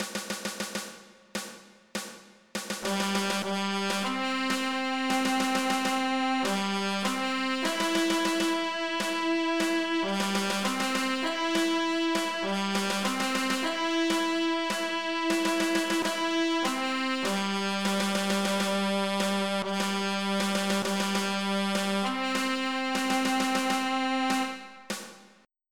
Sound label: taps